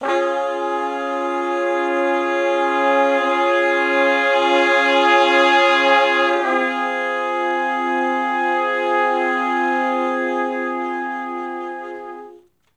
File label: Slo Minor Blus Chords ((IV,IV,I,I) (F), Horncraft; Sections; Crimson Blue and Fabulous Horncraft 4 RnB; Horncraft for R&B